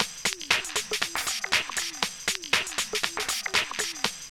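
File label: drum loop; X-Static Goldmine 3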